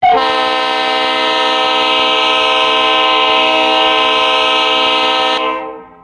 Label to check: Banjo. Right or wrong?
Wrong